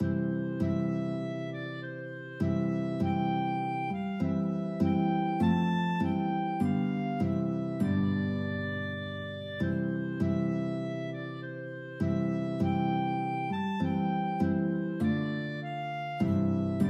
at the cross clarinet guitar, guitar clarinet duet